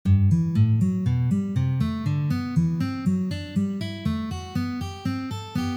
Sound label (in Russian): Uprazhnenie dlya igry gammy Sol mazhor